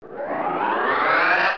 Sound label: Rocket Powerup; worms2